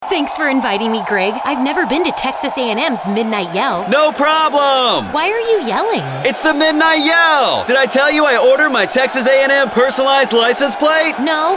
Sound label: Midnight Yell; MyPlates